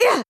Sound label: combo, onehand sword; sura